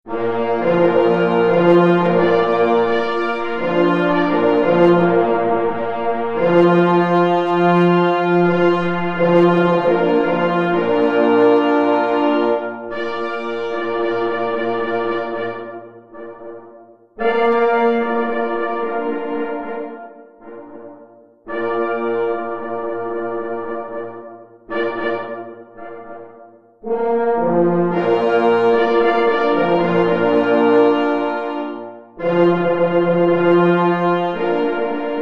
Marsz mysliwych, na 2 plessy i 2 parforsy; Cz. Trybus

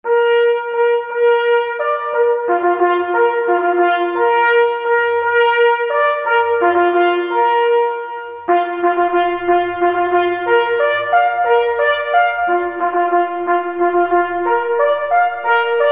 Hejnal; na czesc Prezydenta RP

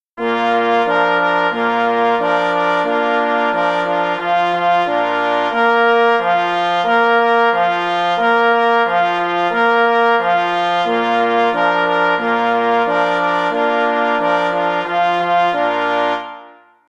Hejnal, z.mieszany; XIX OFMM Czluchow 2014

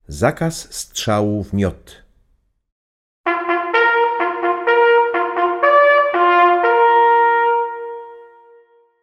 zakaz strzalu w miot solo

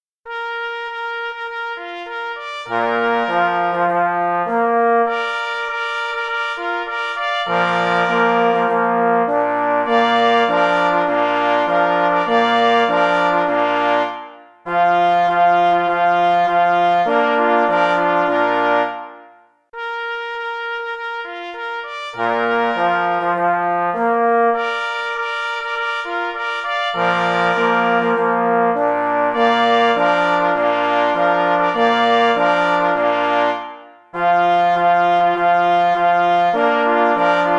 Hejnal Lesnikow Polskich; z.jednorodny

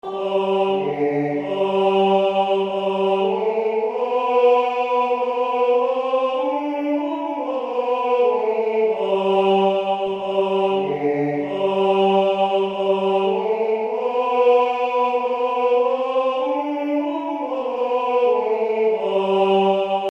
kanon melodia; Do kniej

